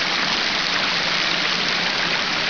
brunnen nah; de pandemonium

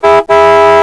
hupe

